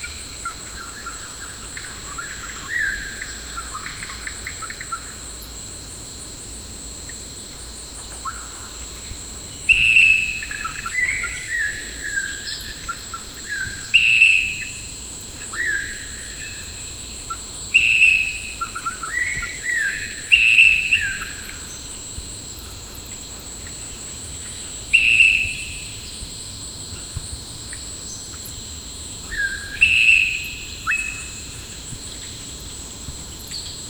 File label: deep in a dense tropical, tropical